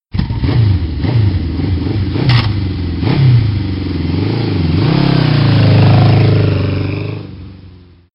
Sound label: motorbike